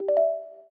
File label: In Call Notification; G7; ui; LG